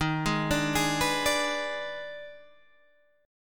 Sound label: Eb Major 7th Suspended 4th Sharp 5th Eb, Guitar, Open G